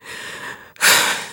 breath; Garry's Mod; hl2ep2 content

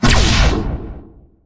main shot; weapons; bison; tf2

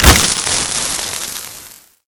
impact flare; Garry's Mod; tf2

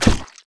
body medium impact soft; Garry's Mod; tf2

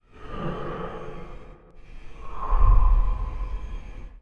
Breath, toy zombies

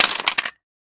item light gun drop

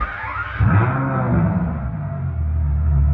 start, sim fphys fer laferrari; tralalelo ferrari; salon

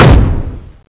step; Garry's Mod; omnidroids pack 1

